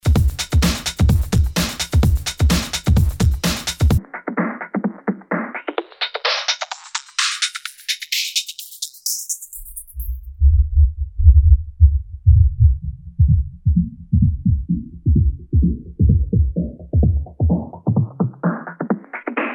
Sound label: Loop Filter